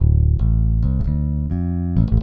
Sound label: bass